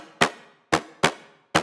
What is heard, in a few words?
syn blip